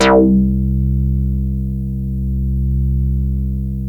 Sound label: ODY, ARP Odyssey